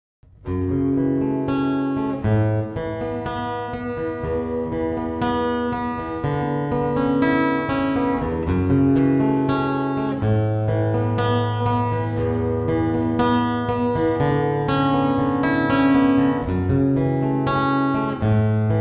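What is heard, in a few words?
Acoustic, guitar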